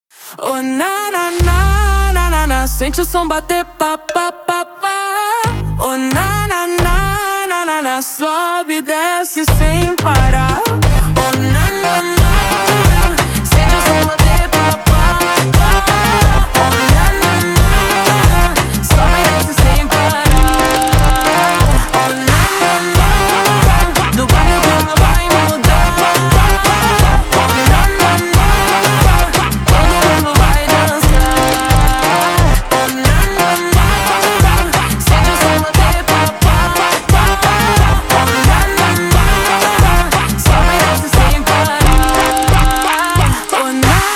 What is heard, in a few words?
slowed, funk